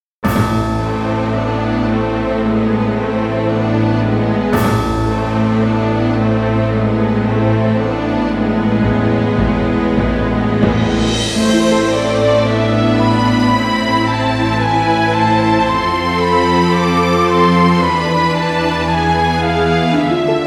Lose; Culdcept Saga

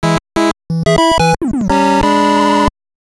Game Over 8-bit Version

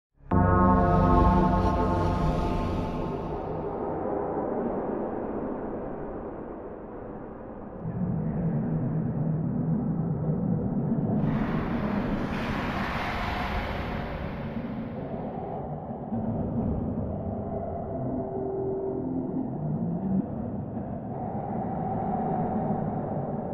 Husk Ambient; Fortnite Battle Royale

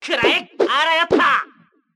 double slash; goemon

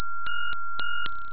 Screech; sfx; attack moves; pokemon; gen 2; gsc

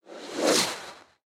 Logo Appear; Boomerang Fu

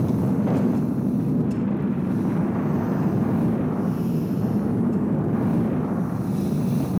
SFX loop; Disney's Dinosaur

